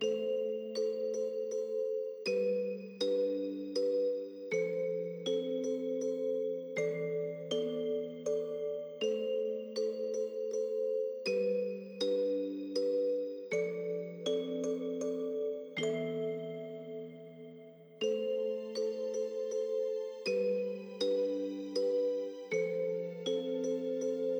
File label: Night Ambient (Loop); 25 Rpg Game Tracks